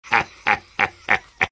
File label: laugh evil; sniper; source engine; tf